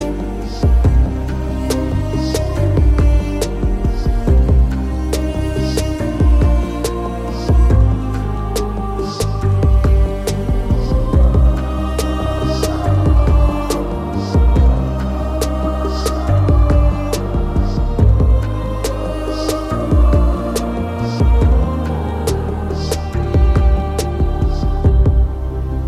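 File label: background music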